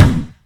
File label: Terrain Rubber, Collision Sounds; opensim; CollisionSoundsAssetSet